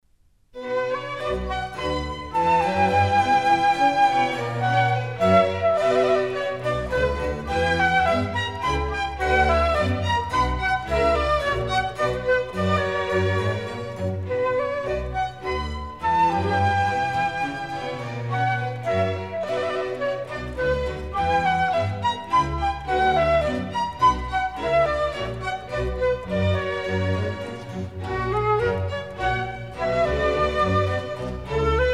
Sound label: Orchestral Suite No. 2 In B Minor, Menuet; Johann Sebastian Bach; Bach- Air & Badinerie Orchestral Suites; BWV 1067